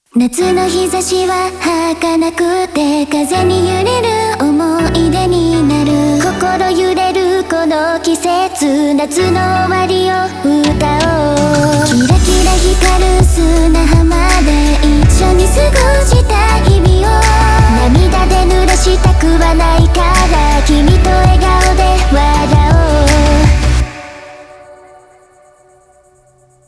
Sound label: All Full Mixed Inst